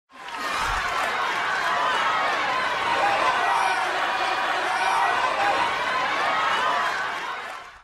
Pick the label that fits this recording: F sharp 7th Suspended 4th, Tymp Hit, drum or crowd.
crowd